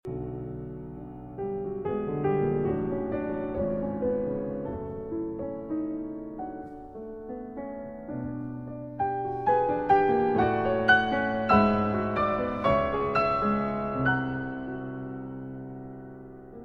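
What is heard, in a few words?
Variations on a Polish Folk Theme, Piano Works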